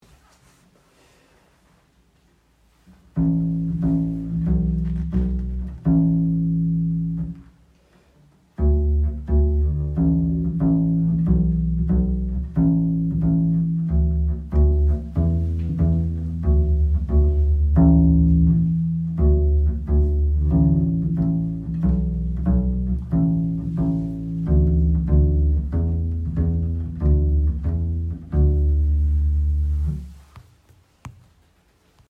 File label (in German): Bass pizz; gezupft